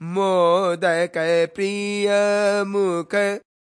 male devotional, Indian Singing

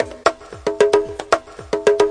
gated congaverb, 113 bpm

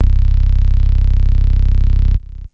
fat n low; Basses

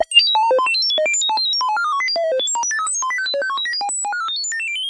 pure random data; Computery or Science Fiction

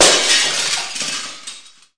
big glass break; Sound FX Real Life

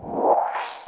zwoop in; Weird and Interesting Hits; single hits

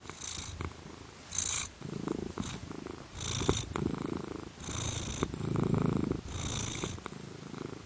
purring

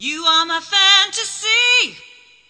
diva you are my fantasy, Cheesy Divas, vocals

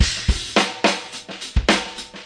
splishsplash, 106 bpm, drum loop; 101 to 110 bpm

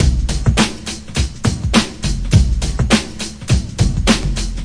classic brk; VIBRIS CLASSIC BREAKS

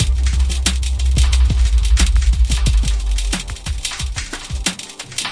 090 double deep bass atmos drums; SAMPLED MUSIC LOOPS

